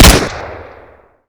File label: wpnfire svt plyr dark, SVT40; weapons